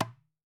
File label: ROSEWOOD, African Drums